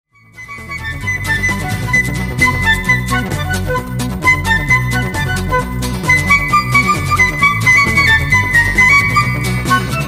sax

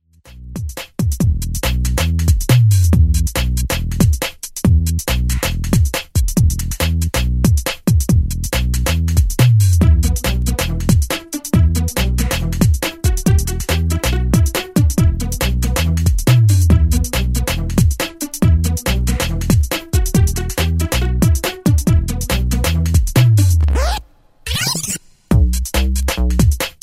sweet instrumental

